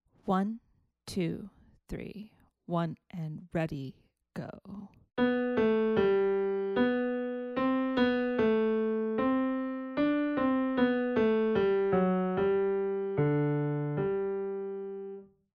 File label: melodic dictation